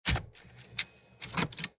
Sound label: Casino slot machine receipt printer